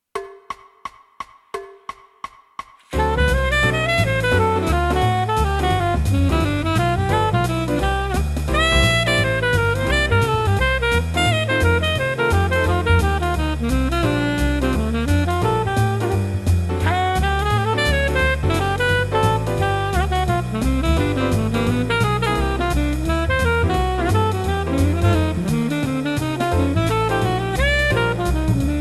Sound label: sax track